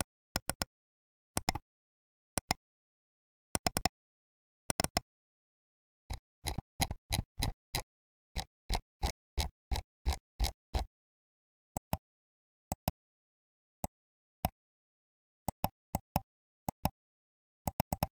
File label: mouse - ps2; devices accessories utilities; NS Studios - ultra sound collection